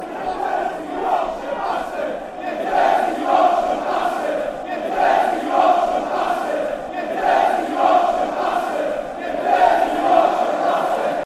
you dirty yorkshire county fanchants

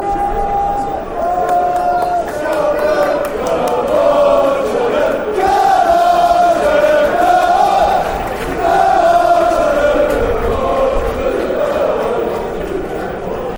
come cheltenham slow

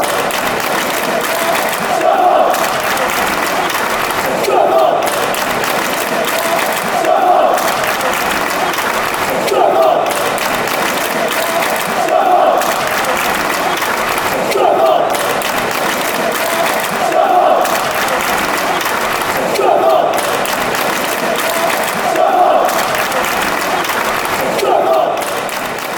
clap, chants; scunny

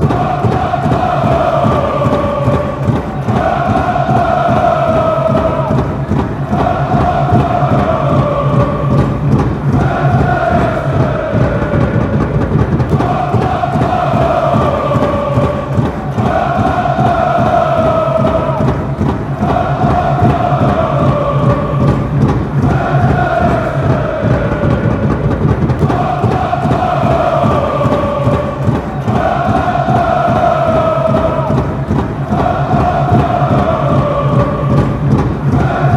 oh oh oh ohhhh, chants

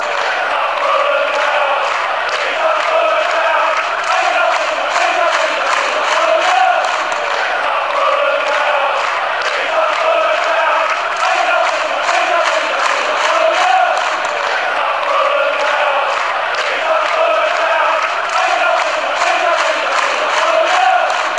bees up fulham down, fan chants

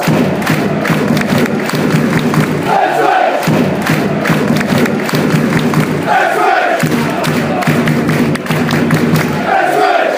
ipswich drums, fanchants; Forever and ever, sung regularly, another Ipswich football song

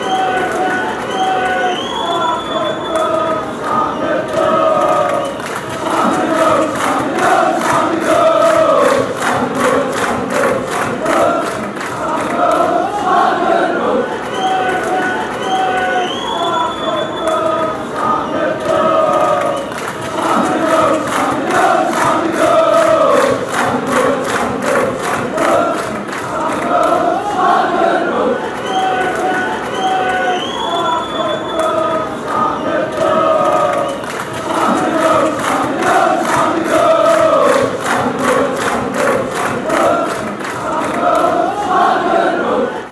den haag chant

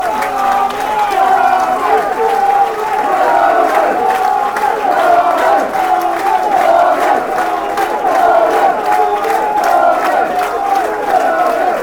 cod army, chants

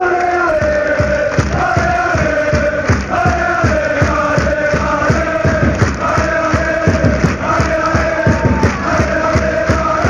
allez allez, fan chants; nice

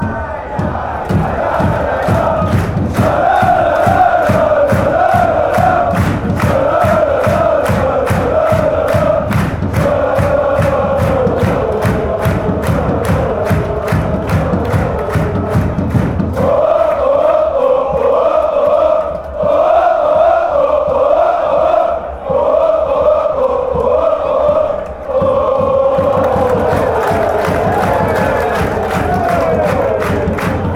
oo lala, fanchants

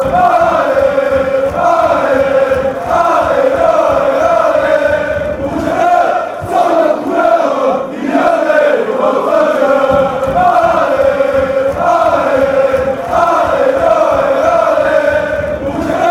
allez allez, fan chants

